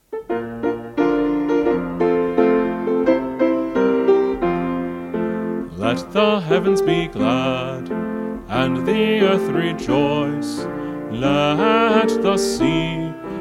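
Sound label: Jingle Bells Psalm All Parts, Choir Practice Tracks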